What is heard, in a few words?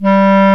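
clarinet G2; AKAI S-Series CD-ROM Sound Library VOL-8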